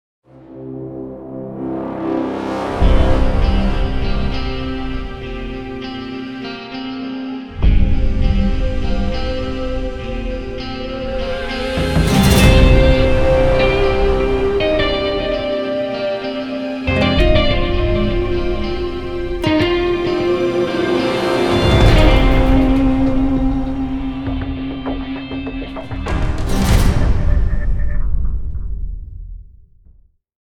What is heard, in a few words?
Intro Signal